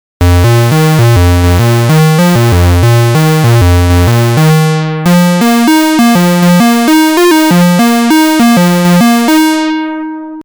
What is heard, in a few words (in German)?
Sägezahn Rechteck E